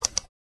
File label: click